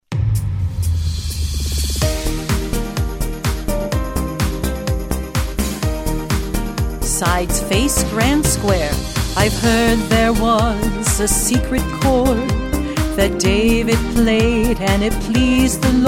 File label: vocal sample